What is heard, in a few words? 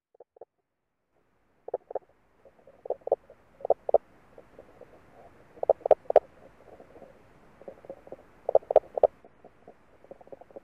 Pelobates fuscus